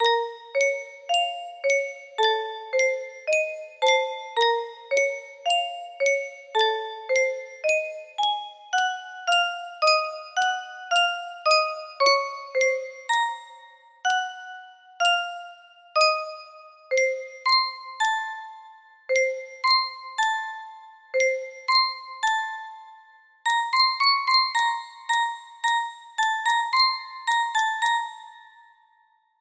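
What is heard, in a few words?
music box melody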